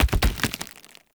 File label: Body Fall Default, Foley; Enemy; Mockeries; Mockery